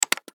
MECHANICAL BUTTON, Button, Mechanical, Click, Multiple; Production Element, Imaging Element, Accent, Transition; Multimedia; Blastwave